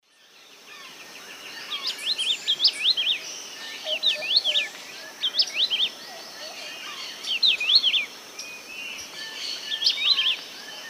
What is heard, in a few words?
Hylophilus hypoxanthus, song; S. America; Eastern Lowlands